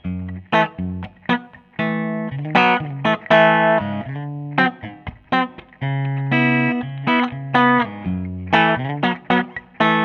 Clean Vol 13 Bright up Mid Boost off Jazz Treble 13 Middle 11 Bass 12 Presence 13.30; ODS; Master 17.00